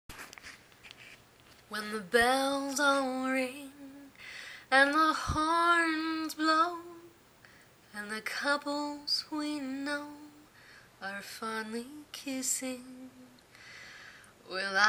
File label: sizzle; Christmas08